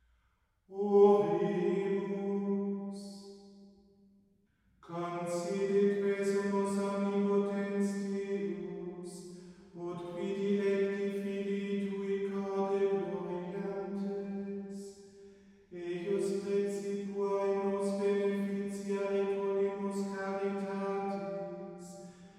Ordo Missae in Lingua Latina Collecta, Chant

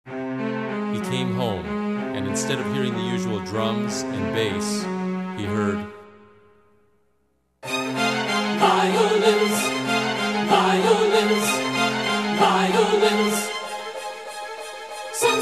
Senseless Violins

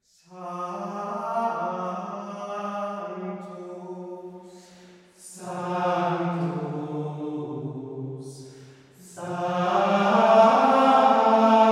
Ordo Missae in Lingua Latina Sanctus, Chant

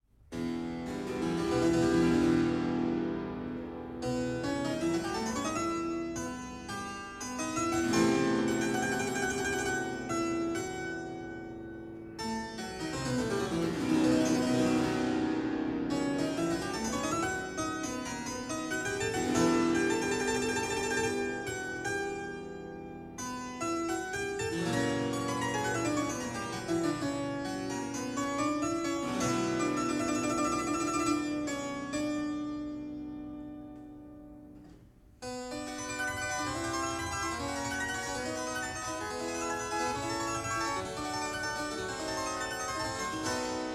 Concerto No. 3 in G Major; Brandenburg Concerto No. 3 in G Major; BWV 1048

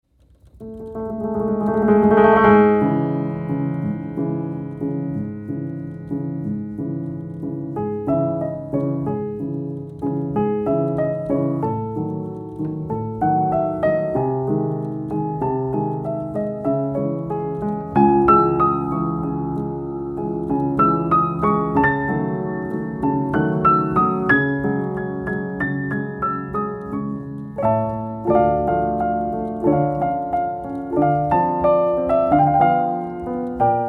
Jingle Bells

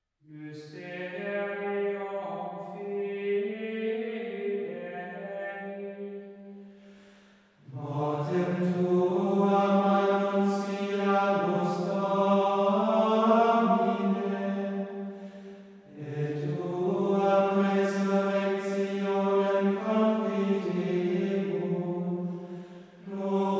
Ordo Missae in Lingua Latina Mysterium, Chant

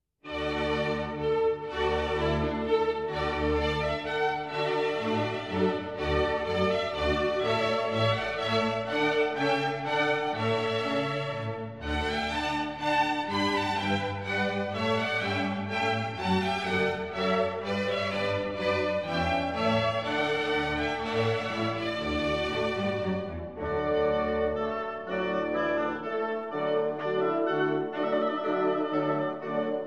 Music For Firework Menuett; Haendel; Wassermusik & Feuerwerksmusik; Menuett II

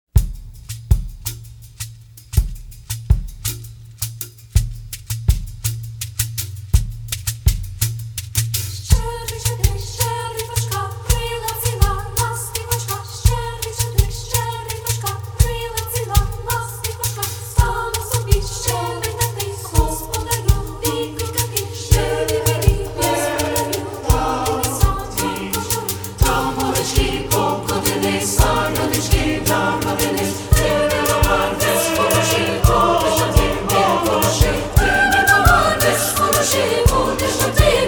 Ukrainian Bell Carol; Shchedryk

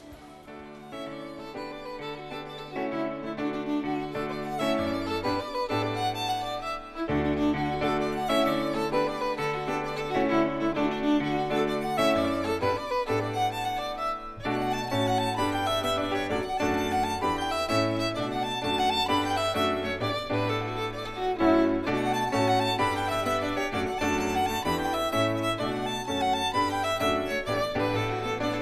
Carraroe Jig [Instrumental]

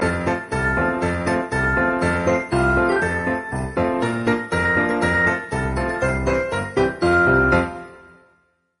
jingle; Games by ANGELINA; A Christmas Present

